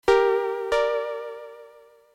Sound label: Sky Blip